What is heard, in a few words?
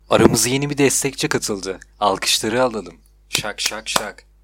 sub alert; sub one silver